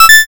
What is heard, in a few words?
notify